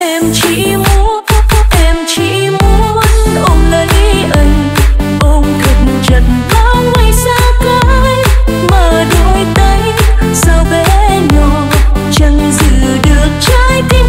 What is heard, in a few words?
remix